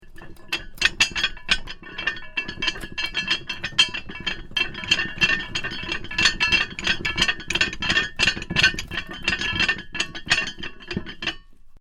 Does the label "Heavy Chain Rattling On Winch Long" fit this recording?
Yes